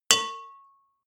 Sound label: bowl hit